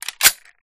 switch; resurviv

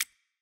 Empty Barrel

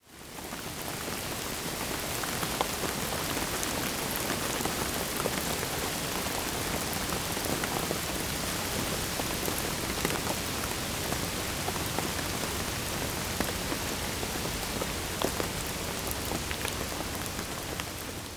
rain

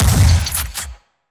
Fire Shotgun

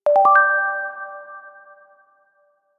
joining chime

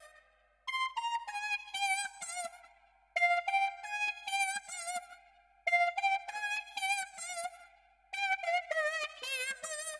MELODICS, viral hiphop

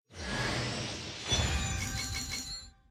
reveal 5 star; genshin impact

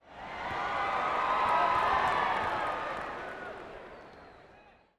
crowd Reaction arena small Reveal; spellbound

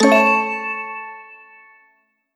success

